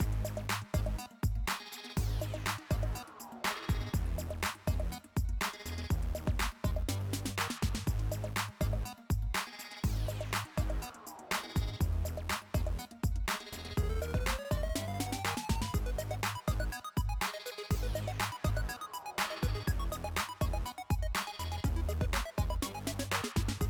music; Ben 10 - World Rescue

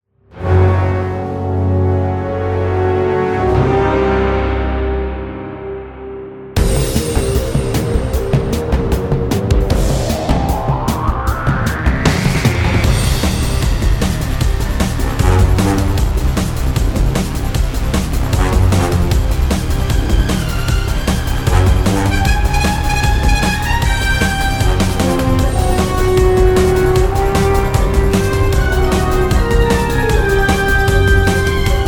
little tense; Danger Mouse - Ultimate

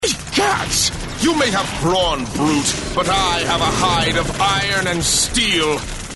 New Wave; Ben 10 - No Arm Done

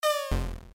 slime fall; Adventure Time - Elemental; ThrillGames

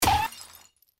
Broken Vase Hit; Mr. Bean - Bean Skidding

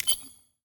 ui restart; Ben 10 - Spot The Difference

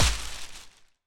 tackle hit deck; Cartoon Network - Toon Cup 2020

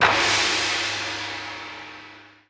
Gong; UI

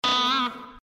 fire held note release; Adventure Time - Elemental; ThrillGames

